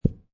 Billiard Pool Snooker Ball Hits Edge Of Table With Felt; Pool ball, snooker ball, billiards ball: Ball hits edge of table, with felt